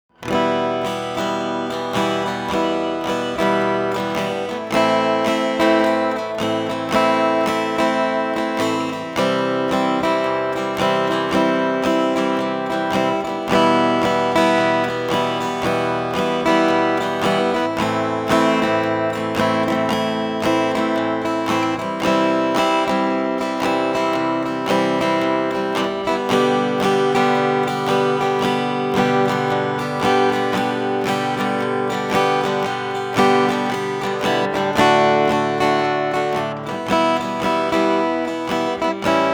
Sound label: guitar track; Lone Cowboy Background Guitar; Lone Cowboy: Rise of a Star